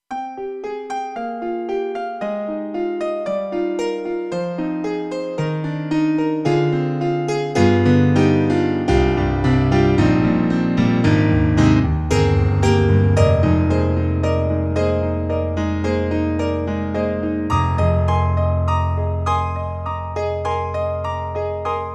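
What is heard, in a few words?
Nu'Coustic, piano; KORG EXB-PCM08; TRITON-Rack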